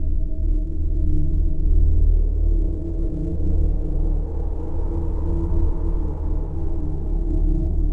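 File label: ambience vent mine; Star Wars Knights Of The Old Republic II; XBOX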